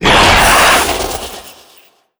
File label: spawn, antlion; creatures; vox